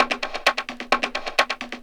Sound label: drum 130 perc, drumloops